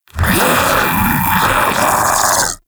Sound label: Infested Splitter Joined Vocal Alerted